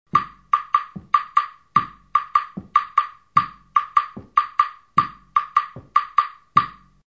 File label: claves